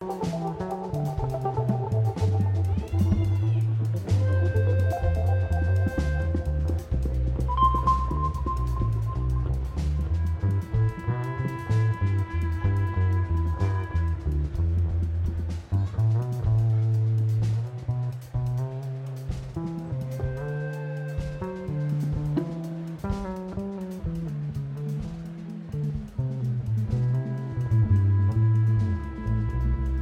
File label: Bass Solo